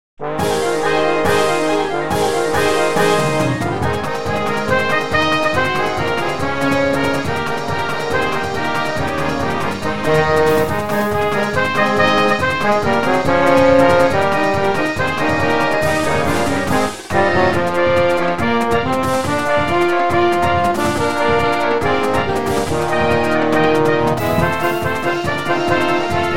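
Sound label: train medley, marching band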